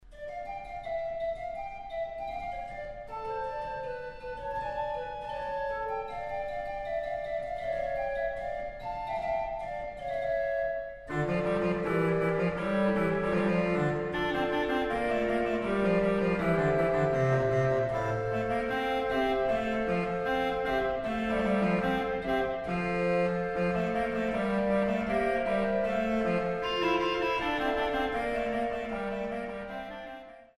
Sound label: Basse de comhorne, orgel